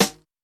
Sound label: sizzle snare drum sound g sharp, Royality free snare sound tuned to the G# note